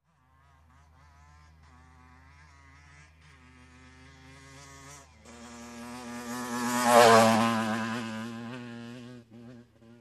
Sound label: Offroad bike passing by